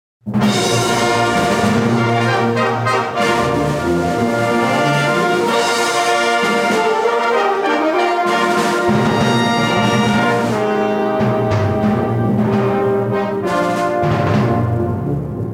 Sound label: Fanfare And Flourishes